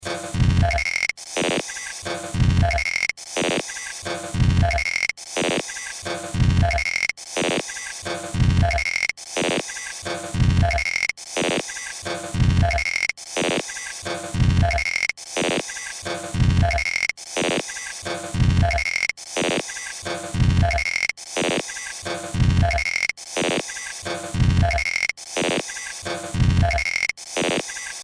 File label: glitch loop